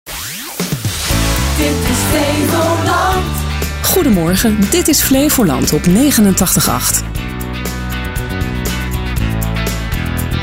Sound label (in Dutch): jinglevoorbeelden